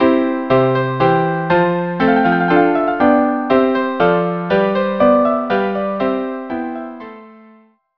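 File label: minuet in c, string quartet; bach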